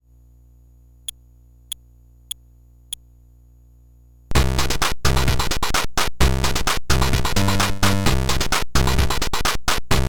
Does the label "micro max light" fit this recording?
Yes